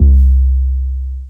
BASS NOISE 808 C; M8; M8JUNGLEPAK1

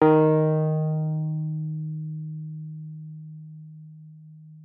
WURLITZER, D#3; Xsample VOL-1; 90 s Sample CDs; WURLITZER RS

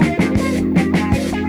Pretty Band 080, Lounge Lo Fi Break Fillz

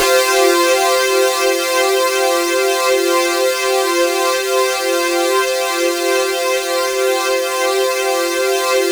C4, Synth Line; 90 s Sample CDs; NiceBeats; SynthLine VOL-27

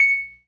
PERC, Synth and Organ

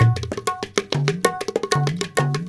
INDIA R; Spectrasonics - Heart of Asia CD2

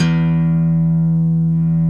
6 STRING GTR; OMI - Universe of Sounds

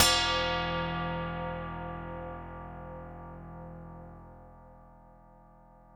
ORIENT E1, ORIENTAL; Music Store; Yamaha VP-1

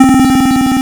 C4, LSDJ Notes; LIMAT LSDJ kit

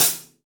R8 Closed HiHat

